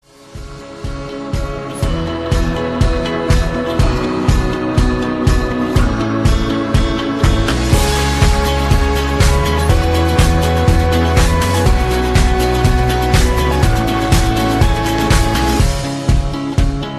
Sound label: Instrumental